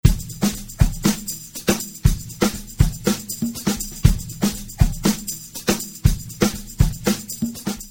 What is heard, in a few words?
busier rhythm, Busy 1-2-3-4 More interesting and complicated than the last example